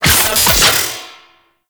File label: Iris Door Open B; Ambience; Gameplay; Warframe; Corpus Railjack; Comet Shredder